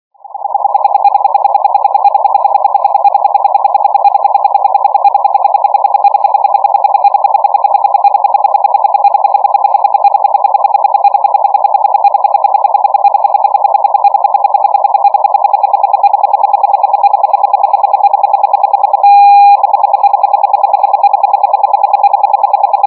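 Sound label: 9.9960 MHz CW Morse; morzet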